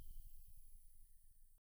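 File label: Music Dpad Evaporate 145; from dust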